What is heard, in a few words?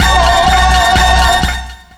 instant psycho